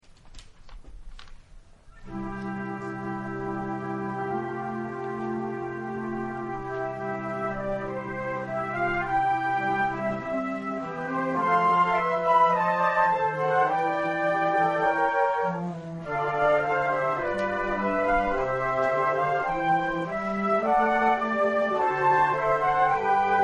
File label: concert, flute; AUTUMN concert 2016